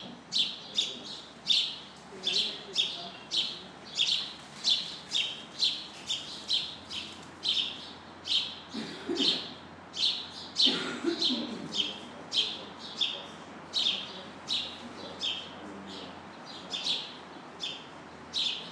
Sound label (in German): und die spatzen pfeifen lassen; fur alle die unbeschwerte frohlichkeit brauchen